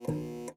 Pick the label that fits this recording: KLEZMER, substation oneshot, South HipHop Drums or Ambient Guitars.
substation oneshot